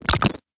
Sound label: Error; mighty party 2017 gamerip pc ios android